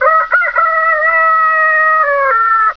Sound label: Rooster - animal sound; Widget Workshop; A Mad Scientist's Laboratory (1996); Maxis